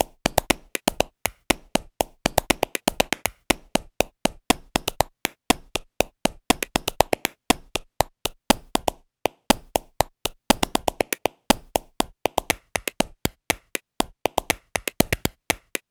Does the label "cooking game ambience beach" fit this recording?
No